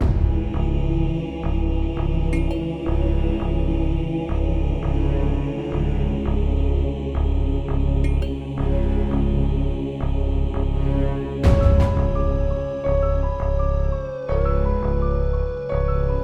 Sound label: Caves Loop